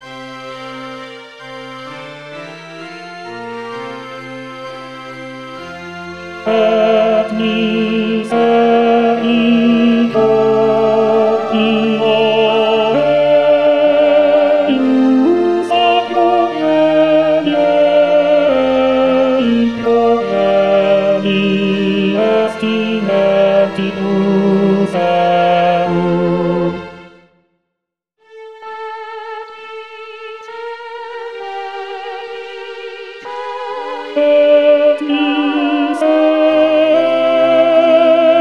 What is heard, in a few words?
ten et misericor